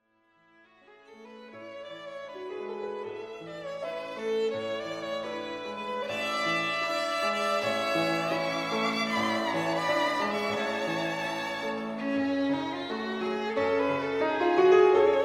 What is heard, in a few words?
Nocturne For Violin And Piano; Chopin